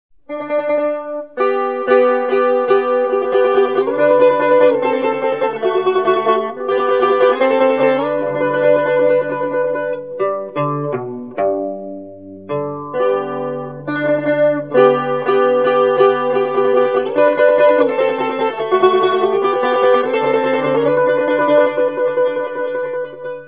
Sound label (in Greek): Μαντολίνο